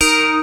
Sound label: Melody Shot D#, dubstep; One-Shots; Melodies; Murk; Ghosthack; Dubstep Sounds 2025